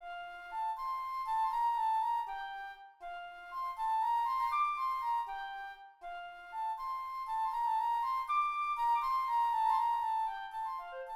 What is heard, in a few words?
flute